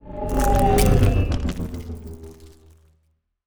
pro ship colony move; biogenesis

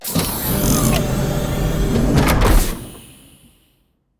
door opening; ancient relics

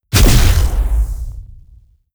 dragon wing attack; Stellaris; guardians

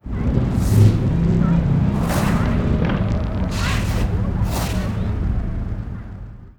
event cityscape; Stellaris

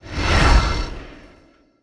sfx construction ship moving out; Stellaris; nemesis

